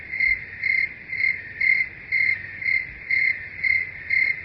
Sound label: cricket groop; risingsun